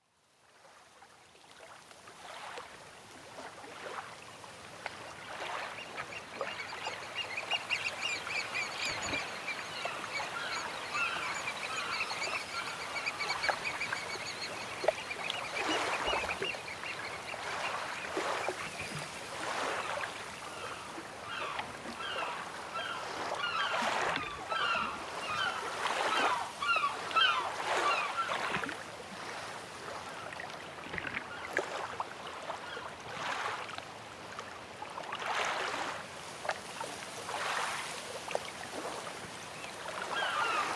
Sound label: The Marrows Ambience (Day)